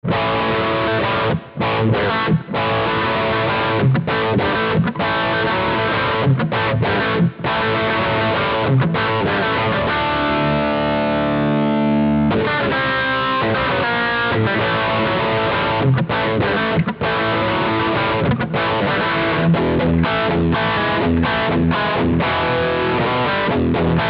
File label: GT-10 Plexi Rythm